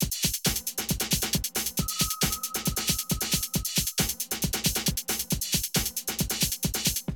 Drum loops, Rave & Hardcore

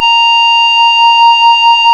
Synclav FM, WIND, A#; Big Fish Audio

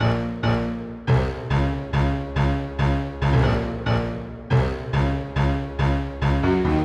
Piano Stack Riff Bb C, 140bpm; Zero-G Sound Sense Grime Scene; GS Construction Kits; Its Primetime

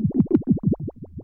RATTLE, RHYTHM; AMG - Vince Clarke Lucky Bastard

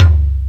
Yamaha Tg33, Drums

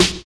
CLUB 808 SN; Drums In Your FACE!

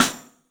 MARFSN, 3D Drums, X STICK; 3D Sound

